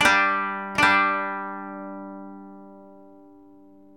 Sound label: DRONE, Koto